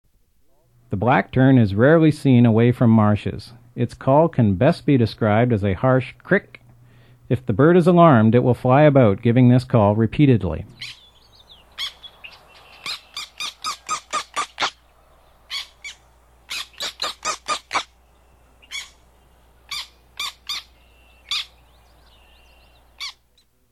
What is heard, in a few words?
Black Tern